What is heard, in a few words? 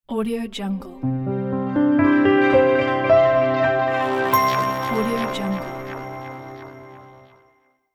piano logo